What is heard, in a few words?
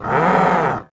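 creature bol attack light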